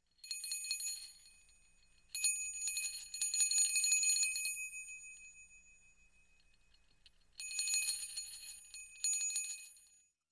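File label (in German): glöckchenklingeln; Wavemusik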